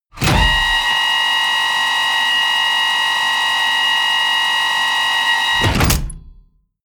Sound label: tank medium turret rotate